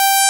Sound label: Hybrid Bass G5; Multisamples; Digital Sound Factory Xtreme Lead